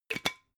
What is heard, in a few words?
glass bottle massive close cap short